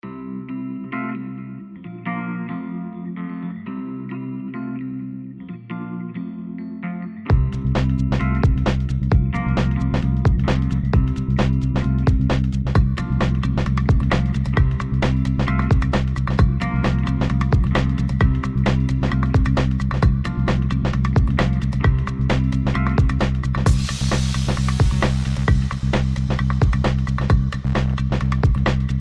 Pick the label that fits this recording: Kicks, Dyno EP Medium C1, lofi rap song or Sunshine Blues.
Sunshine Blues